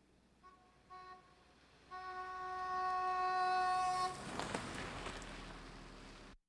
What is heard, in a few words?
Automobiles various